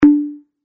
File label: not clear